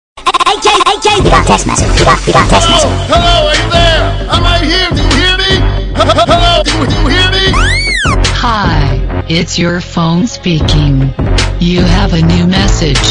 Hip Hop Sms

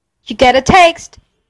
Text Message

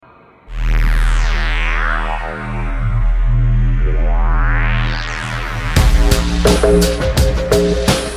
Dancing Tone

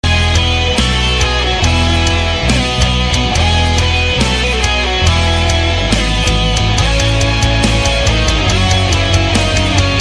metal mix; Kalinka

